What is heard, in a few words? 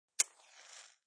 Match flame; Flames; Objects